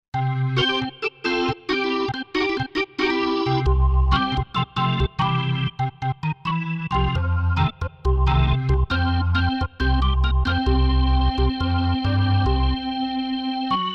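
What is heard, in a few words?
Dirty B3 Organ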